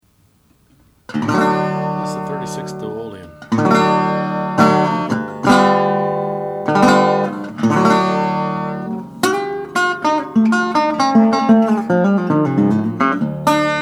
1936 national duolian gray duco

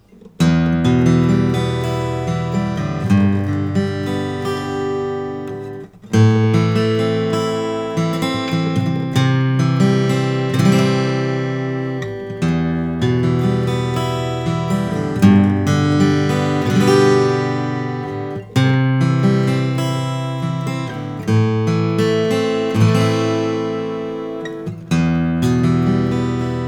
charis sj cedar indian rosewood

